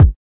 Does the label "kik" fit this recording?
Yes